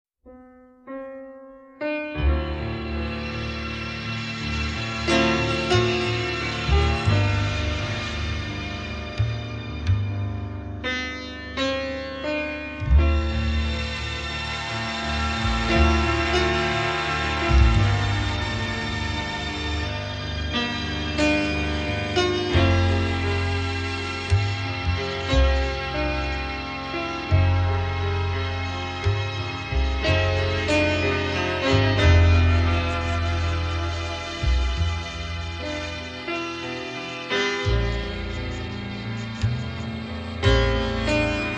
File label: piano source music